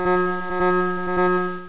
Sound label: alarm